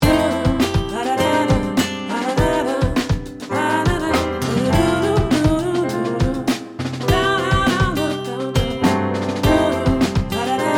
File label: original oke inst vo